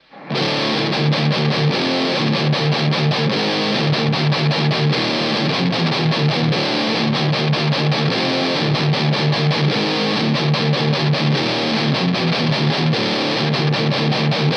high vol high gain

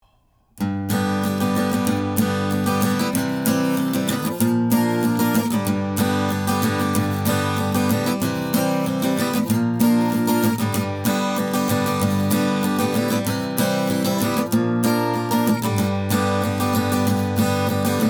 forty dollar hohner; Sm57+MD421 Mic combo for Amp

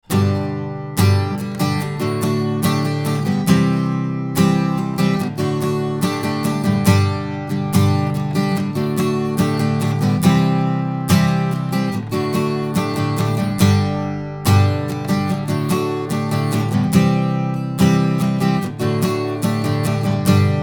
strumming; mics

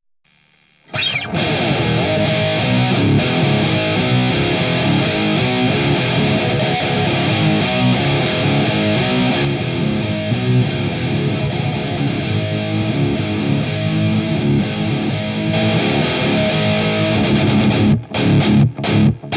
post hardcore guitar; mic placement; eq

